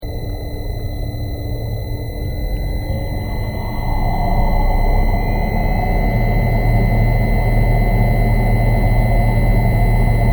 PUMP MOTOR UNDERWATER OPERATING LOOP, Fountain pump operating underwater; Machinery; Machines